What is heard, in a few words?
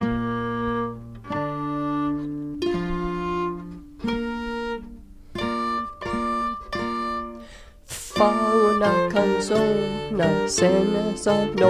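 Soprano Words; Fa una canzona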